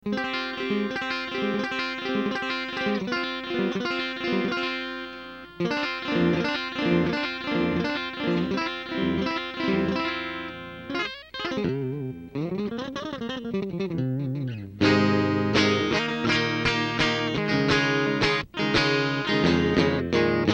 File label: CLEAN